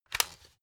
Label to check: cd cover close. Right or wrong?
Right